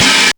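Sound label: DRUMTRAKS open hi-hat, Drums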